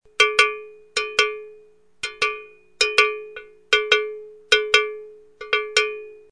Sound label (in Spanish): Cencerros